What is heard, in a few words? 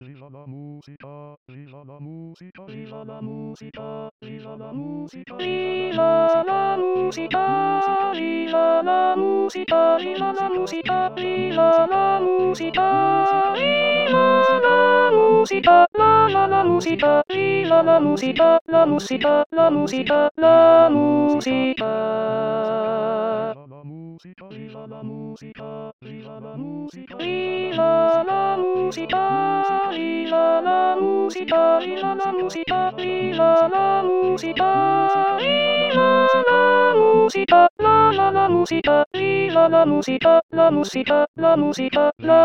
Viva La Musica Alto; chorale adultes